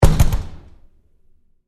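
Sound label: Door, Bathroom Stall, Close, Close Up, Med; Household; Big Room Sound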